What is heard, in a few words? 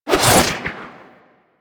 Board Sword Throw; Sundial